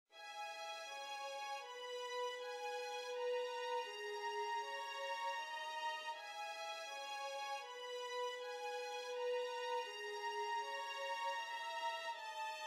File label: Duo 2 violins